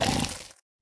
ice nipper lug damage, monster

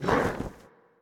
demon; monster

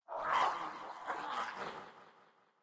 forest, lake

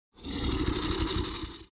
bug shark trap idle, monster

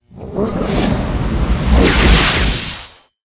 guardian attack, monster

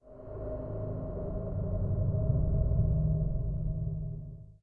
stadium; ruina

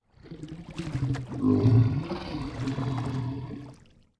kraken idle, monster

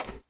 walk iron

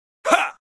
attk

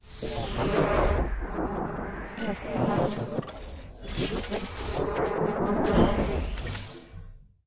idle, monster

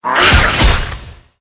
felidae attack

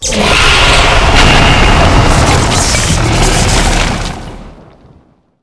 barlog s stone dead, monster

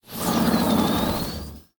Boss Mech Bull main

